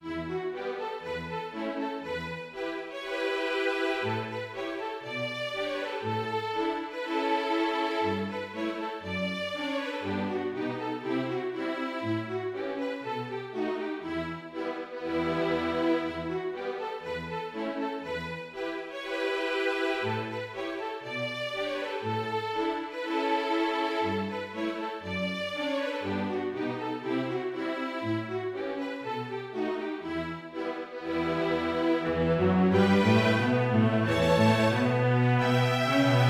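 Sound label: Bartok Braul Strings orchestra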